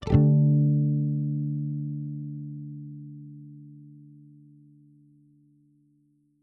Elec Gtr FX, custom, strings, stroke, back, quick, strong, muted, dense, short decay; Eiravaein Works; 48Kilos